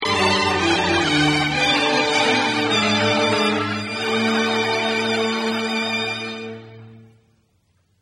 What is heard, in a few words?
cadence violin